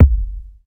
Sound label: strong bottom end kick sample a key; kick drum samples